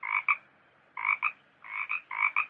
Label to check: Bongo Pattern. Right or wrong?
Wrong